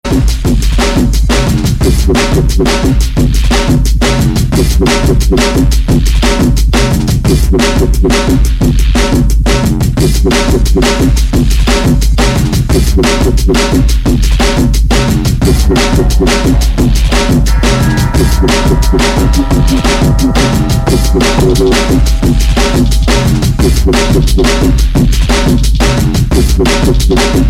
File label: Drum And Bass